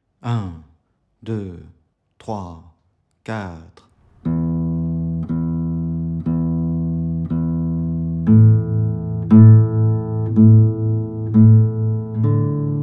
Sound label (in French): exercice 060bpm